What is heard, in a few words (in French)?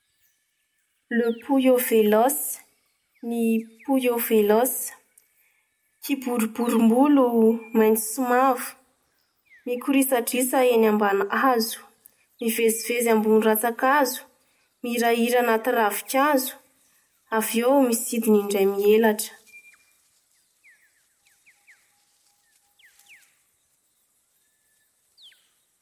Pouillot Véloce; A Tire d’Aile